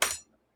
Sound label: knock; gesource; player